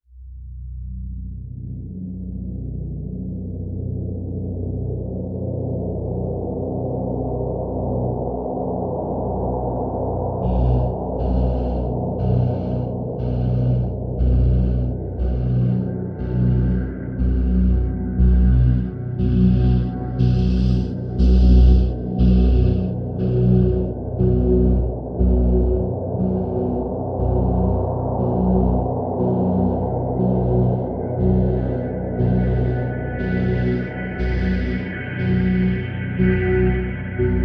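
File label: Drone Wind Farm B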